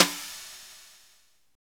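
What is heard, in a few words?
drum roll, brushes; DRM Brushes; Roland - Rhythm Section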